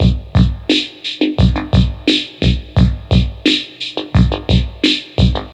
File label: 87 bpm drum loop sample b key, Free breakbeat - kick tuned to the B note. Loudest frequency: 1139Hz